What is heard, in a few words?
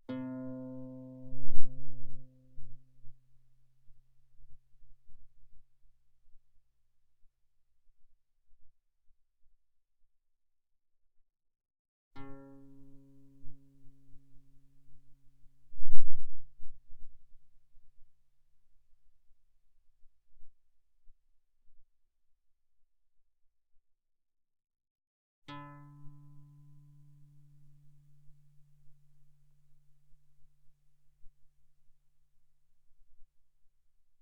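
Guitar pianissimo sul A C3 B3; mono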